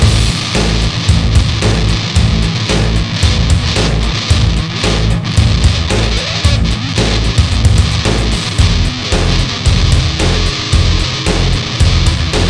rock loop

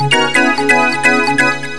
organ riff, techno; riffs; Terra Sound Library

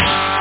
guitar

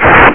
twang; Theldrow

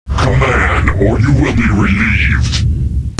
carrier; Starcraft; protoss

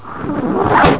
Materialize; Invaders! 1.2

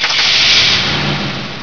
Motor

